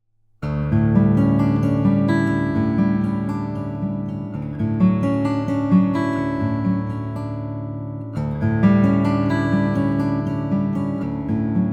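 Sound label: froggy bottom k mahogany, guitar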